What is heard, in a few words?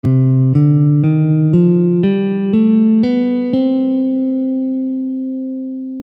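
Melodic minor C, Gammes & Modes · Guitar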